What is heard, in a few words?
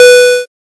safe; inside-house